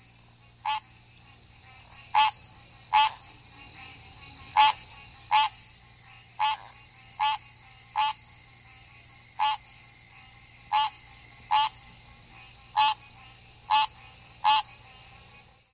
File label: green treefrog